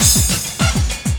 loop, 200 BPM; Ueberschall; Drum & Bass Resonance

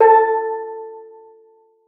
steeldrum A3; Xsample VOL-4

